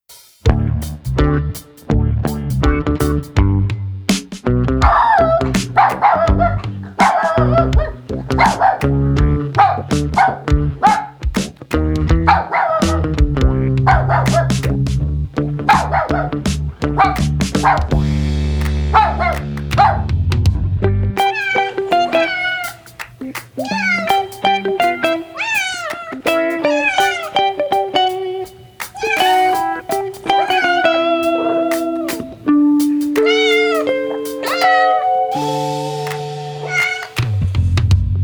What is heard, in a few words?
Dog Bark Cat Meow